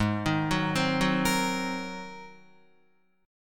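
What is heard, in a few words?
A flat 9th A flat Guitar, chord; Ab9 arpeggiated; JGuitar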